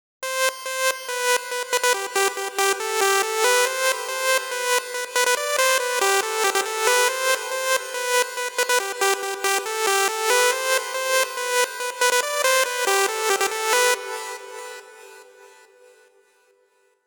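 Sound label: 140 BPM Melody sidechain; Vengeance - Essential Clubsounds Vol.5; Demo Constr Kits; Nessi Kit Root A; Synth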